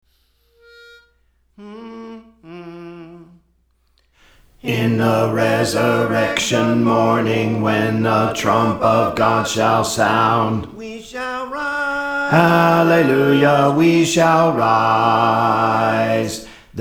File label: Bass; Praise For The Lord Training